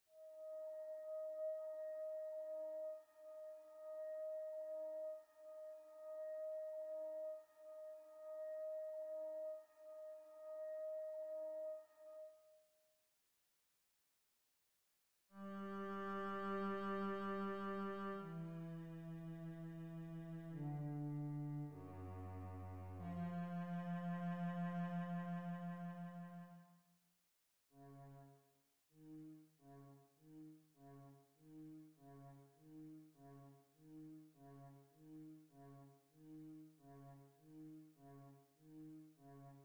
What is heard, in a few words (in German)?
sehr langsam cello